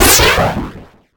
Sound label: cartoon rocket launch